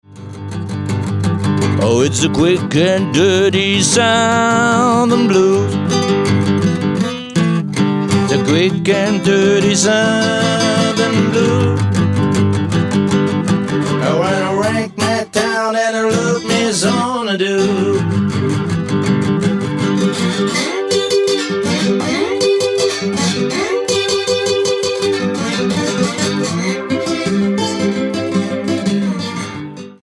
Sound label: southern blues trailer; quick and dirty